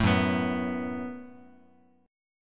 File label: F sharp Minor Double Flat 5th F sharp, Guitar; strummed